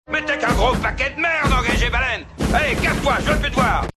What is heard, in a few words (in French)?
Baleine